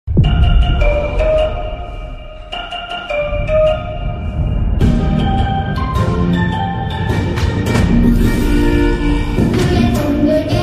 ringtone; squid game 2 mingle game

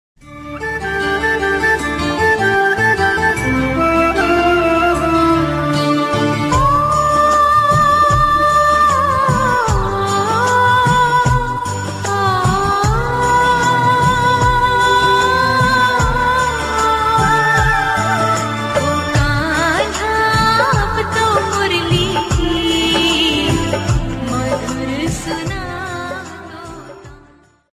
heart touching ringtone flute ringtone

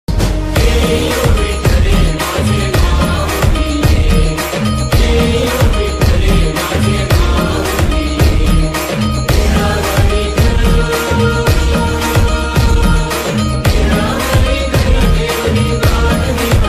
Vitthal Aarti, Marathi Devotional Songs; vitthal aarti ringtone with lyrics

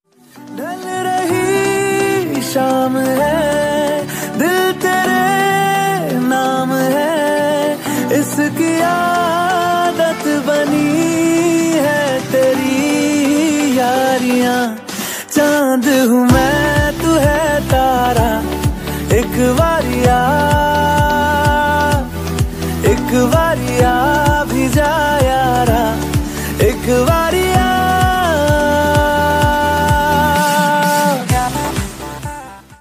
love hindi ringtone

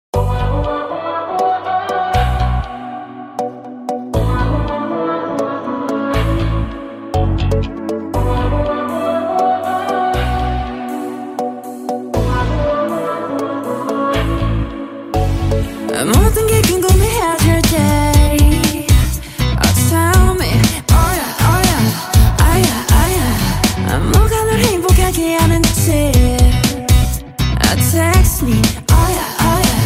ringtone jazz

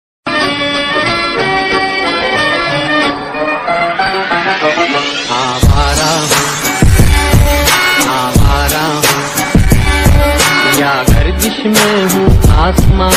old song lofi slow reverb musical sad mashup

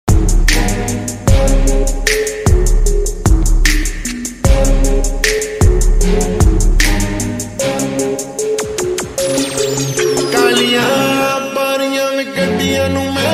attitude tone slowed reverb ringtone hindi

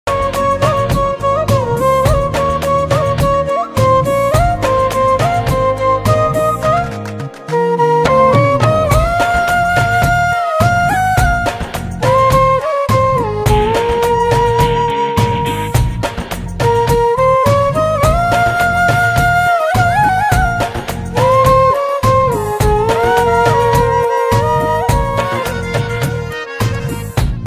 flute version ringtone

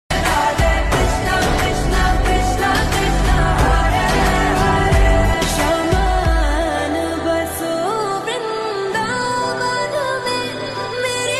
song female version, new bhajan; krishn

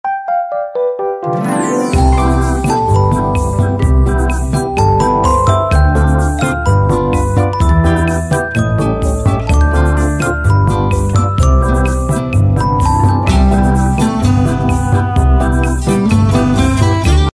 cute theme ringtone; hachi's; nana

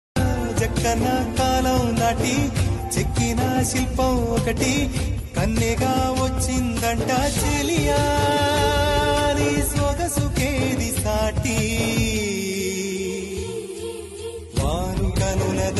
ringtone in telugu; premikula roju

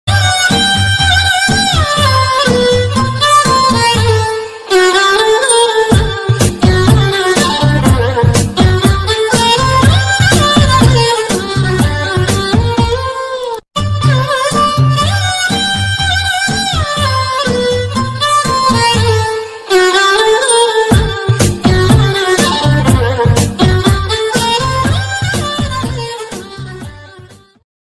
violin bgm ringtone; iddarammayilatho; alluarjun

